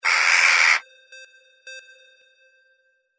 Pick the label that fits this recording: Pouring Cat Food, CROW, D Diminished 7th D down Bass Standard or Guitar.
CROW